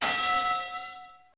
Start; wesole przedszkole bolka i lolka; olimpiada letnia

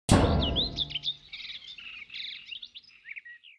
gui Relation Icon Romantic

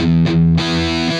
Heavy Combo, Rock Guitars; ACID MUSIC; Automobiles

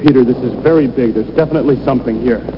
big

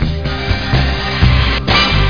BMW Z-3 Exclamation

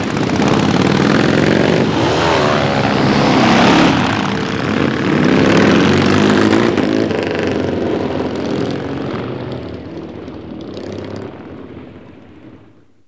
movement

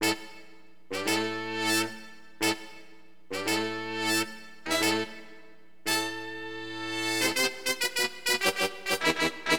ACID HRN, Acid Jazz Funk, Wind; LiveAct Pool

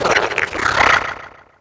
triton hit; DAoC

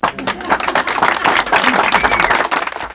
applauz

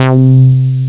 synth bass C2; Flashback

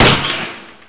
SHEET; PC Gamer 4.2; mtm2demo

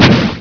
Spell Launch; Realmz

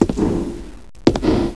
FOOTSTP; RpgMaker

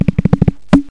s next

